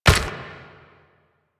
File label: Proto Infested Runner Step Default; Warframe; Steps